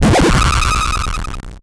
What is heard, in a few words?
Transition Electrical Distortion